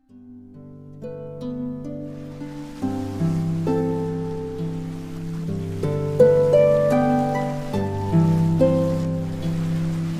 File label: Great is Thy Faithfulness, harp